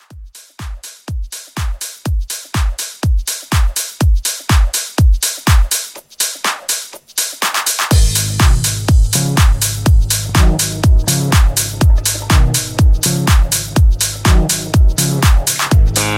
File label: House Re Drum